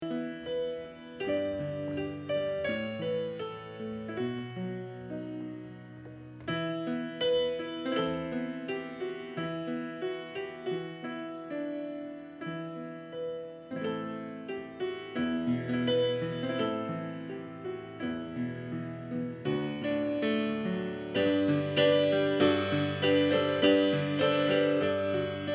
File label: Piano Only